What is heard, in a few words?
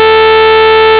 tone